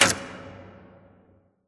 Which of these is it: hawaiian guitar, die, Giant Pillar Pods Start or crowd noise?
Giant Pillar Pods Start